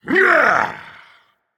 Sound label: grunt attack, dragon; fafnir